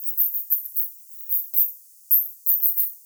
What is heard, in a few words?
Nyctalus noctula, batcalls, europe